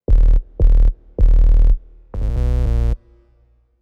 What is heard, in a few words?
HipHop Synthbass D#Min; Digidesign; Pro Tools Essential Loops